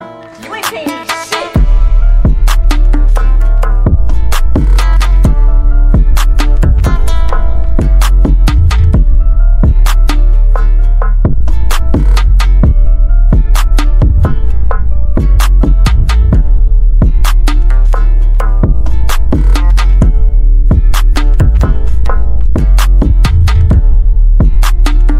trap beat; ringtone

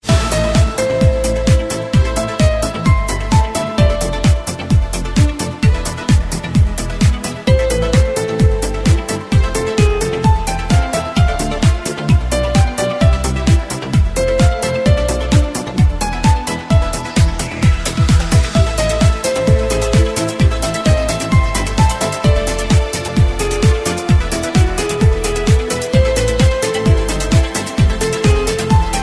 russian theme

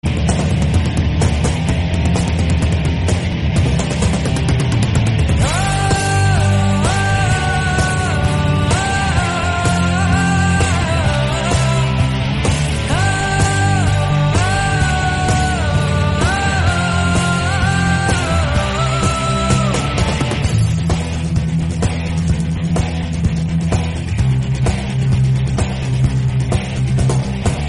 remix

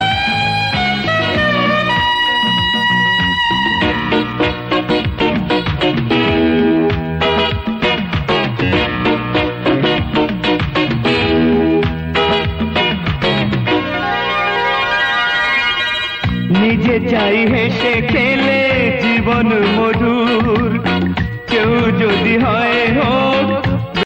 bengali ringtone

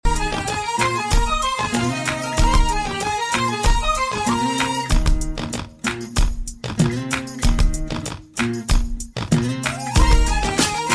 latin ringtone

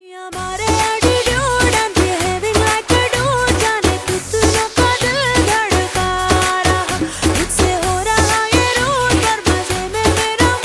dj ringtone

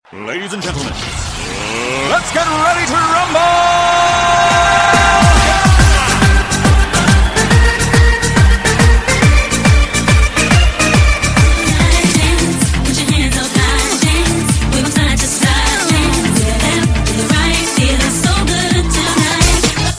dance mix

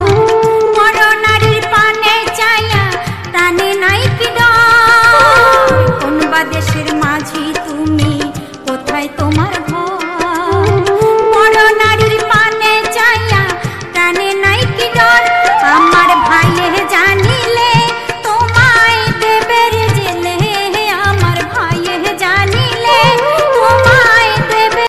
romantic bengali

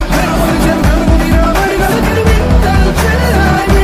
notification

